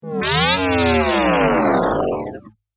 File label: Arcade Game Slow Failure; Video Game Sound Effects; Retro Inspired Sound Effects - Think Nintendo, Atari, C64